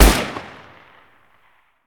ump45 shoot, weapons; tf2classic

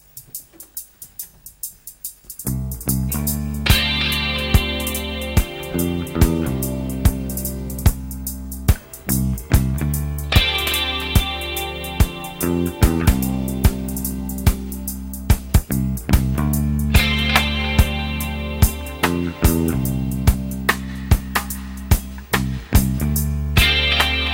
walking, reggae; style

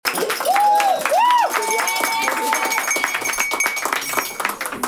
clap

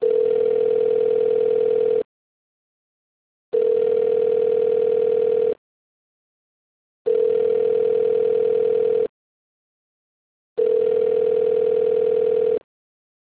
ring; fullcallrecordings

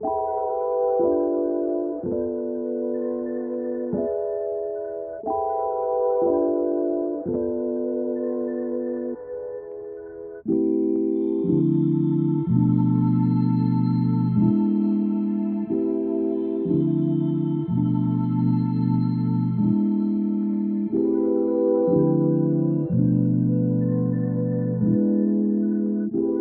92 BPM A Min, RnB; Cymatics - 2022 Melody Collection (BETA)

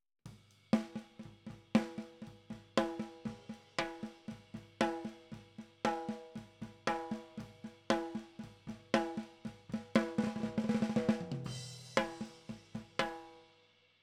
Snare

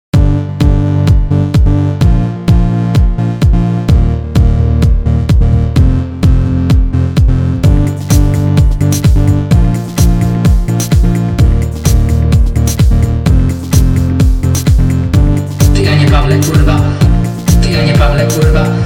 house; pawlacz

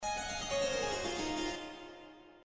jingle; choral de bach

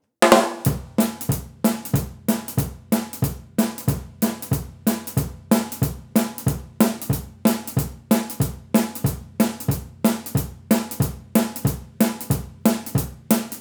Overhead Right Drums

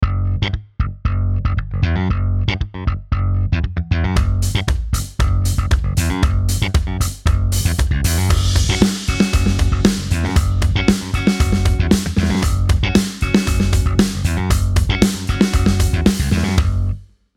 bass guitar logo

